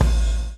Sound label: BLOW; Spectrasonics - Backbeat